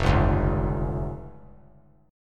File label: F Suspended 2nd Sharp 5th F down; Fsus2#5 strummed; JGuitar